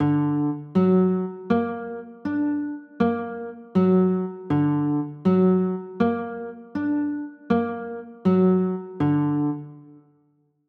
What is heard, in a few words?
d aug, chords